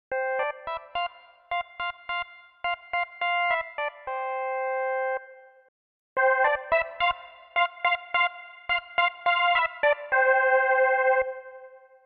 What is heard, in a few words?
unison; plg100dx